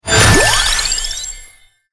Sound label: Level Up; Vampire Survivors